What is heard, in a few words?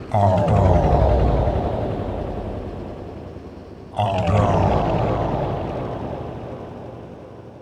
Vocal Loop 126bpm, HYPNOTIC TECHNO; Zenhiser